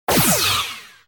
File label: klingon weapon